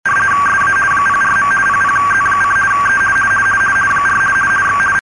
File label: rtty